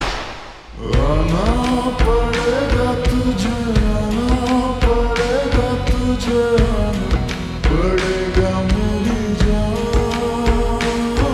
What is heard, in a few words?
Ringtone Slowed Reverb